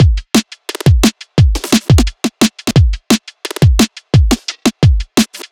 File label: Drum Loops, 174; M8; Electronisounds